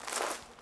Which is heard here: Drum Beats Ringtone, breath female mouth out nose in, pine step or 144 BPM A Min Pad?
pine step